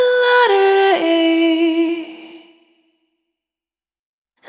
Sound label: Single Voice Melodic Loops; Sony Creative Software; Pocket Diva World Class Vocal Samples; Discovery